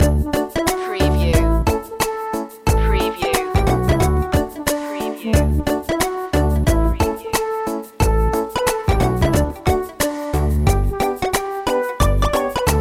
retro video game labyrinth loop

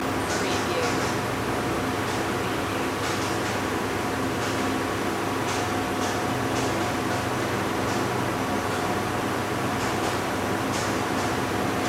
laundry machines ambience loop

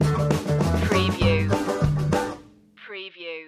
cleos jig stinger

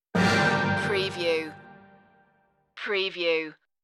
swift wings stinger

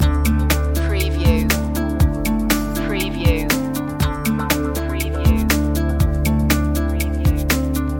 floating guitars loop, chill

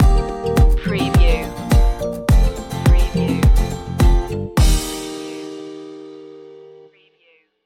island beats stinger

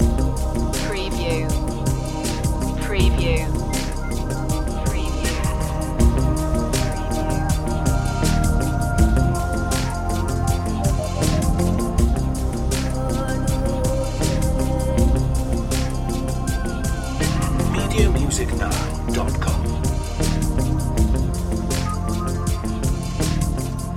lifeline loop

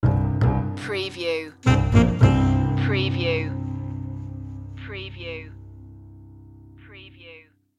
rhythm dance sting

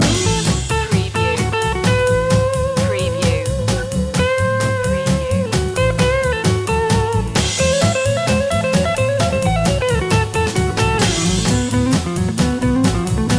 hop loop

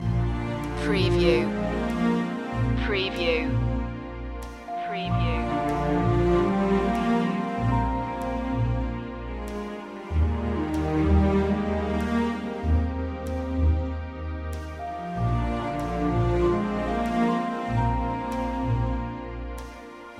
pensive feeling loop

